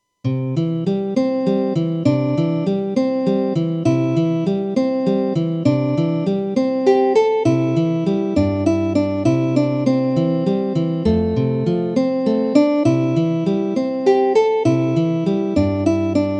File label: synth